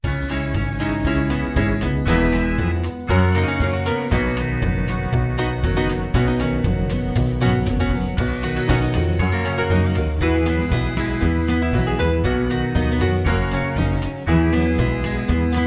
Low Key

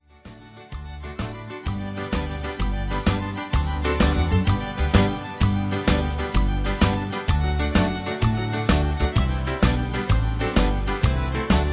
Irish Washerwoman Patter

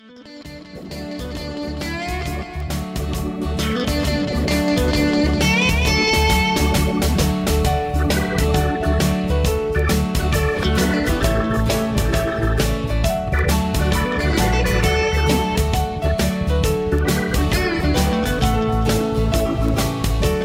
Instrumental

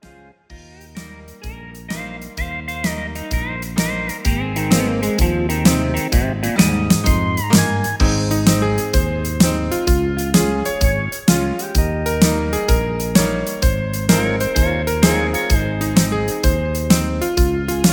Instrumental With BGVs

